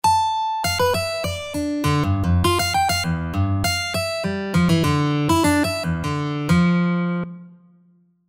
Contour recognition, Jagged, guitar

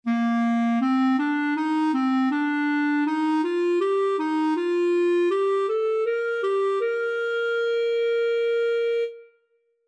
Intervals sequence up clarinet

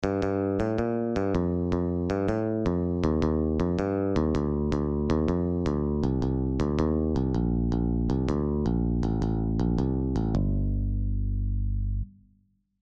Intervals sequence down bass guitar

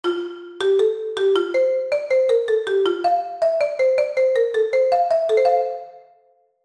Intervals Major marimba

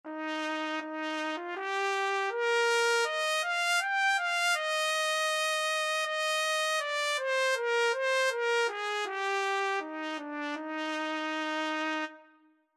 Intervals arch trumpet

